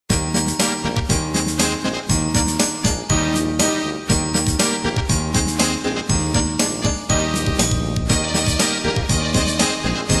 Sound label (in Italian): piccolo coro; Dellantoniano